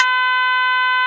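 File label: Shamisen Eb3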